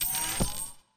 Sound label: win flash; grandspinn